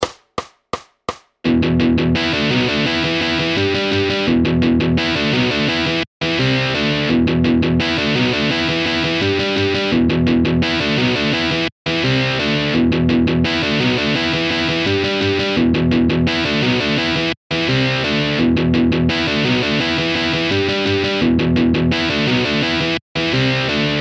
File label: 170bpm Fast Power Chord Riffs in E minor